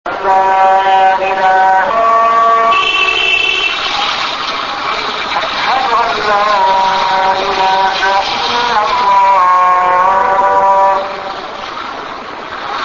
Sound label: azan, beyrut